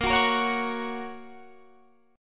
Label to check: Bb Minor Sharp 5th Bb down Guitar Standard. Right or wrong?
Right